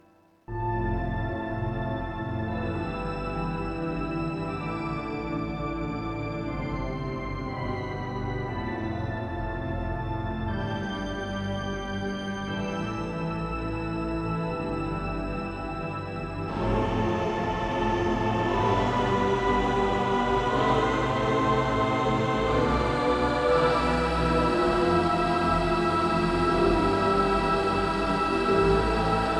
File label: Pattern CHOR